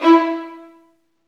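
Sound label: STR, Roland String Master Series, Violins Marcato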